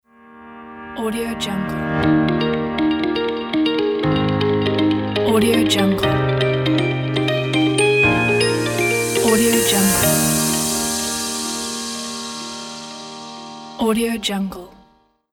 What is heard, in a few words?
corporate logo